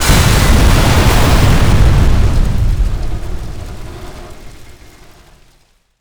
boom

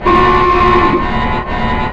siren

